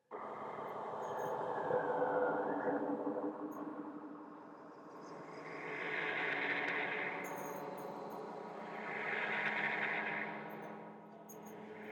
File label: horror soundtrack